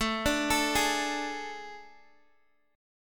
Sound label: A Major 7th Suspended 4th, Guitar, DADGAD